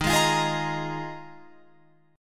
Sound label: Eb Suspended 2nd Suspended 4th, Guitar, Open F; Ebsus2sus4; strummed